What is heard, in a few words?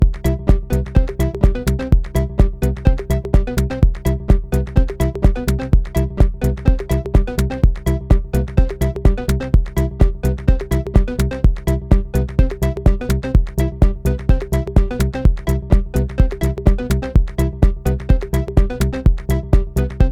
arcade menu loop score; Pix the Cat